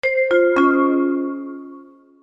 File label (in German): bahnhofjingle; cff